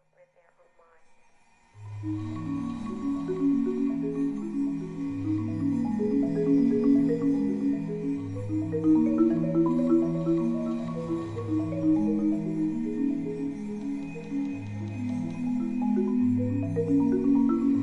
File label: echo, Marimba Solos